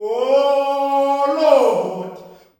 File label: OHH LORD, MALE; Spectrasonics; Heart of Africa 2; S.MALEAMB